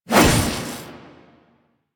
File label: Whoosh C; Tatsu Prime; Revenant Nikana